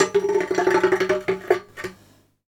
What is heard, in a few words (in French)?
Rebond corde de jmbe